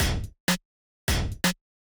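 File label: Washer L, drum samples dance electro; drum сэмплы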